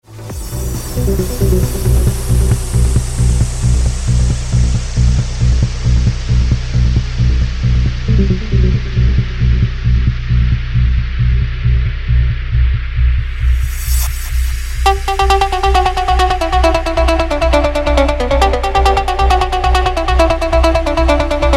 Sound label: Instrumental